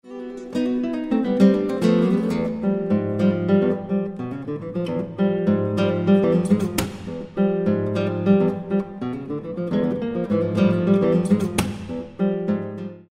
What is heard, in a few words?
folk, guitar